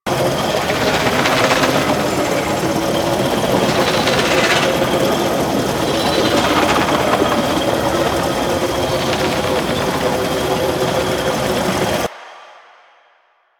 Tank Drone Idle Loop; Enemies; Abilities; Lasrian; Warframe

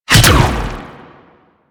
Hand Bomber Proj Fire; Warframe; Man In The Wall

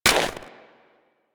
Step Ice, footsteps

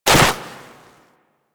Land Soft Snow, Footsteps; Warframe; Operator

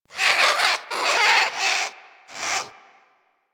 Bird Of Prey Vocal Idle; Warframe

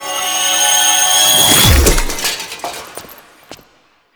Veil Struggle Finish; Quests; The New War; Stolen Plates

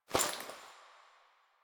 Body Move; Ambience; Frumentarius; Warframe; NineteenNinetyNine

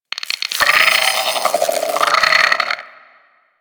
Sentient Brachiolyst Idle; Enemies; Barks

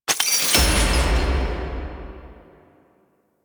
Pickup; Warframe; Harrow; Donda

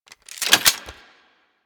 SMG Reload; Weapons; Warframe; Tenno; Sundial

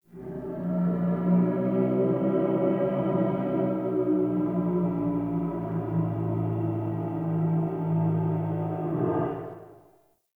Tree Gnarled Oak Moan; Trees

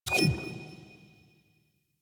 UI Default Theme Sweetener One; Warframe

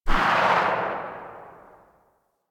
Tail, Pistol; Weapons; Grineer; Amphis

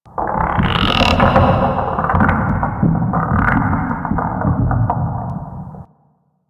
Tentacle Creak D; Ambience; Interior; Warframe; Orokin

